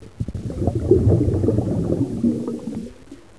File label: in water